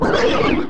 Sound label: scrm